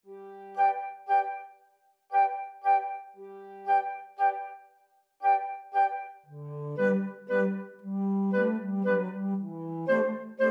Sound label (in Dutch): fluit; L-Elephant; Saint-Saens; Carnaval des Animaux